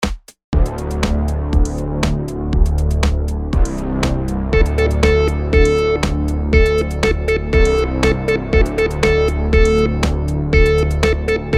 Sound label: rhythm simple, monotone